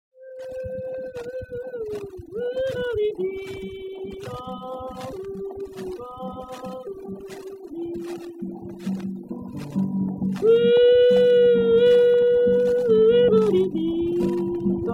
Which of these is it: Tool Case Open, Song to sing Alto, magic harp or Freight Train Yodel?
Freight Train Yodel